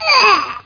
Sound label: SPLAT; CYBER2